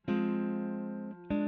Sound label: E. GTR Royer